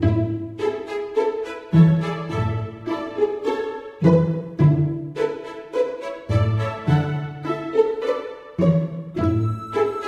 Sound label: Musical Meadow; everdale